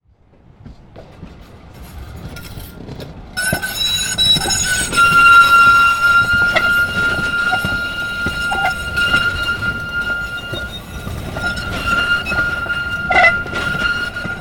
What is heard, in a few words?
Tram Turn